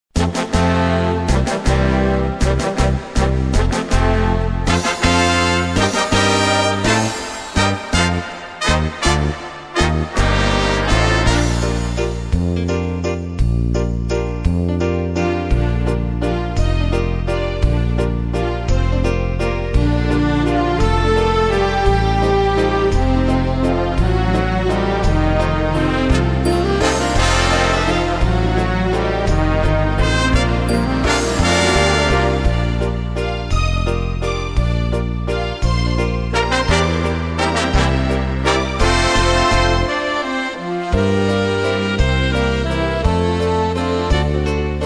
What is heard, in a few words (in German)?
Wiener Walzer